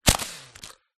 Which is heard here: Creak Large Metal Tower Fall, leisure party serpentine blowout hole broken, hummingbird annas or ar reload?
leisure party serpentine blowout hole broken